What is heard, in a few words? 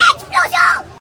explode; minecraft; Texture Pack; spatial sound; Son en 3D